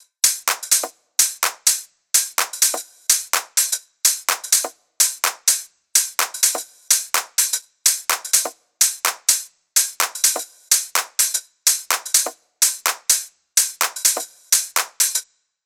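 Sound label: Drum Loop Progressive House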